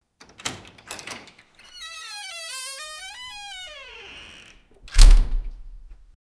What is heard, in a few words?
mysterious opening the do, mystery